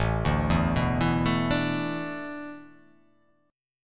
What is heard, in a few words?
A Suspended 2nd Suspended 4th; Asus2sus4 arpeggiated